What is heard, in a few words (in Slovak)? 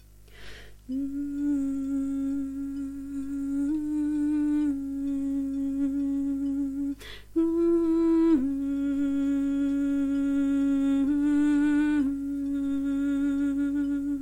Sopran